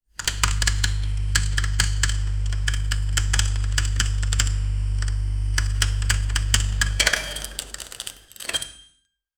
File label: pulling on a generato, cave